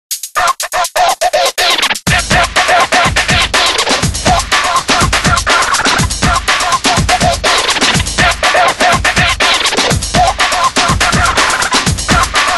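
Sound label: Ring Dust Remix, Windows Mobile ringtones; Microsoft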